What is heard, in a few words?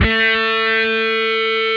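distorted guitar; Tank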